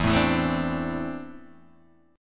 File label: E 7th Suspended 4th Sharp 5th, Guitar, DADGAD